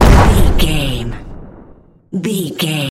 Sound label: explosion large